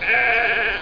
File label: sheep